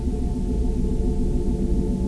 alien noise

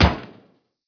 medkit close

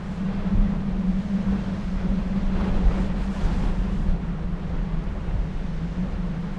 Wind tunnel

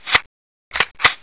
shotgun reload

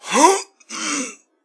pain; doctor

